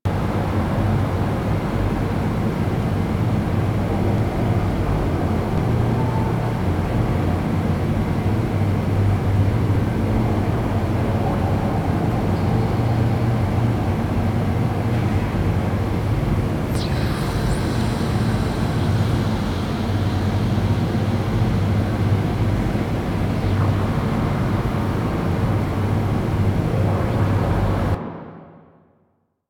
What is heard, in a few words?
Diorama Landscape Loop; Duviri; Sol